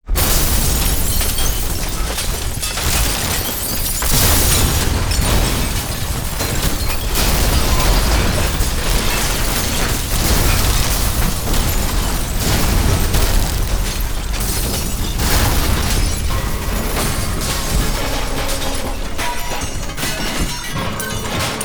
ultra break

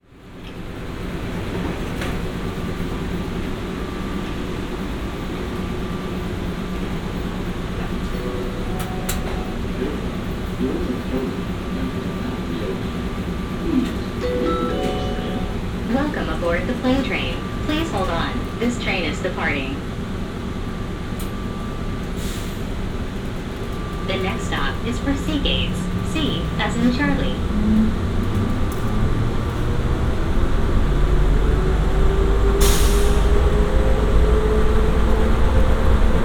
Atlanta Plane Train

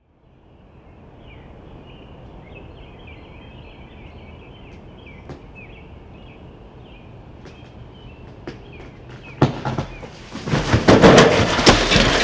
Tree Branch Crashes Into Fence

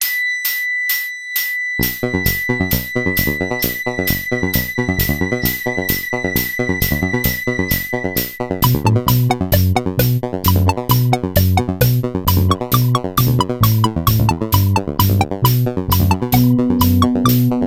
Pop Bell

